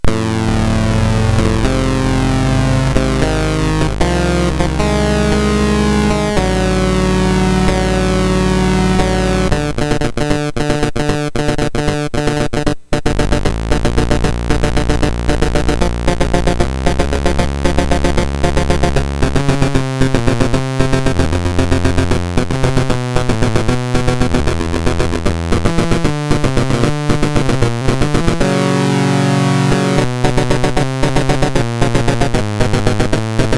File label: Death Knights of Krynn, MOS6581R4